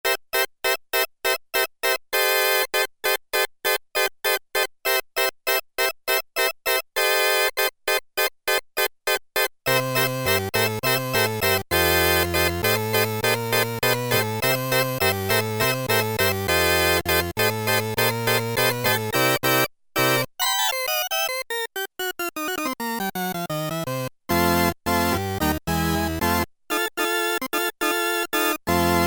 COMBO ORGAN, Oberheim OB-8; Factory Patches

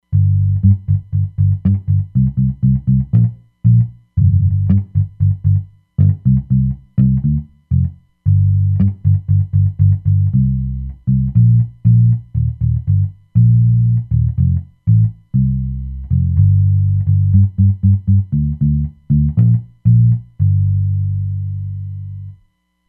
Tubby Mute; Roland Fantom XR

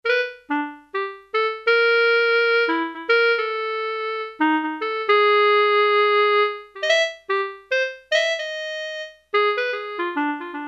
CLARINET, Yamaha PSR-300; Voices

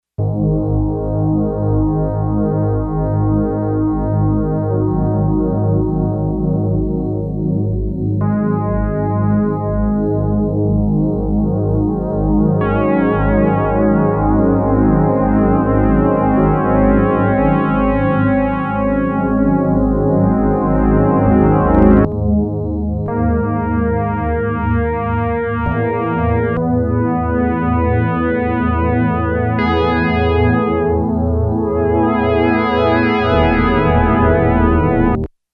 Whasers, Yamaha TX802; Factory Patches; Voices

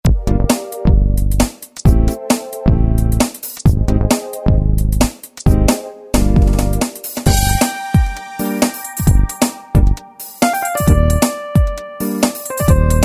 Garage 2, Yamaha YPT-400, Styles

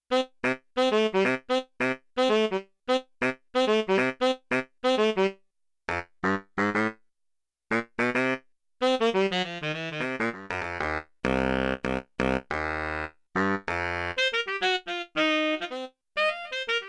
Baritone & Tenor, Big Band; Yamaha TX16W; Big Band Performance

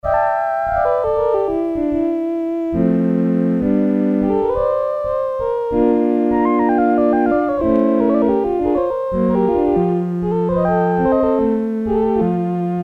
organ, Casio SK-1; Harmonic component sound

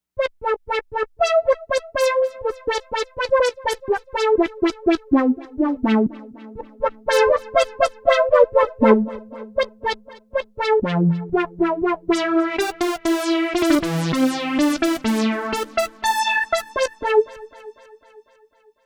Novation UltraNova; Factory Patches

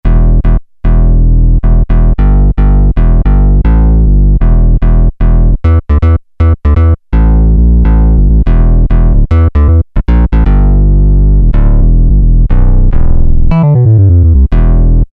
SH-5 Solid Bass, Roland JD-990; Vintage Board

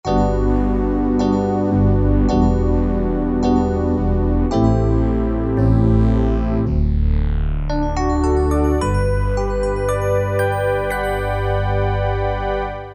EP SERENE, Casio VZ-10M; Internal factory combi

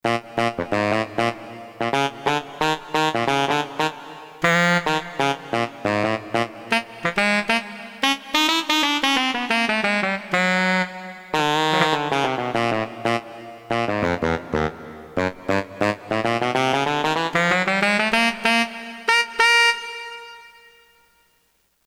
T. SAX, Korg DSS-1; Brass; KSDU-002 Brass

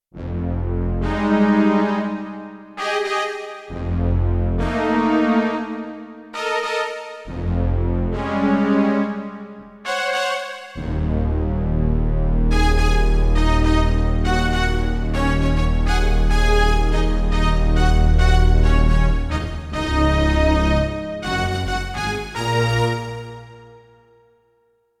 Horn String, Roland D-70; Factory Performances

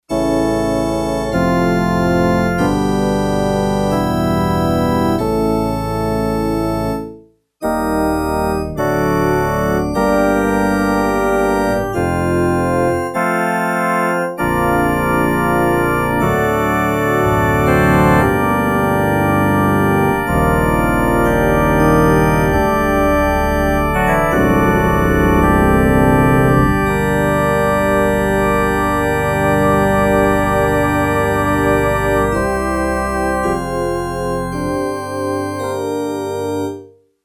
PuffOrgan, Yamaha TX802; Factory Patches; Voices

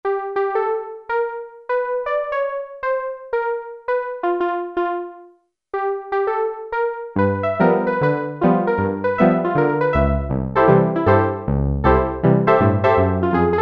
RAGPIANO, Kawai SX-240

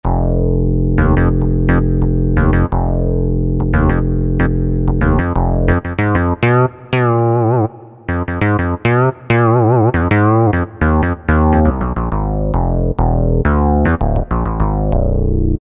SYN.BASS, Roland SN-U110-09 Guitar And Keyboards

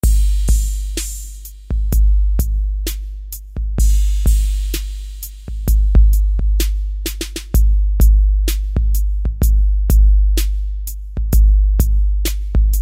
EDrums, Kurzweil K2000; Kurzweil K2000 Contemporary ROM; Contemporary ROM Programs and Demos diskette

